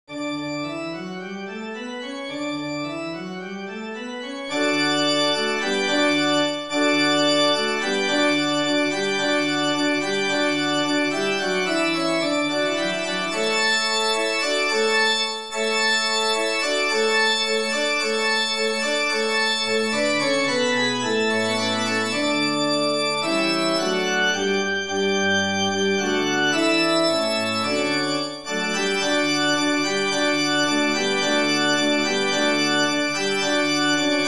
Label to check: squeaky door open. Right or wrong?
Wrong